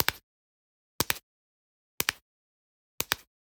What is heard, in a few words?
Generic Button Wood Small; boom library; The Interface